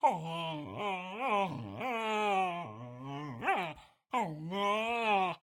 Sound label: vocalizations, medium, nasal, whiny, playful, wavering, elongated; Eiravaein Works; Epona